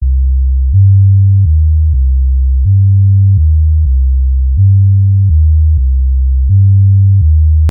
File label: Deep Sub Bass Melody